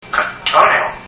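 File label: "cut toenail", parrot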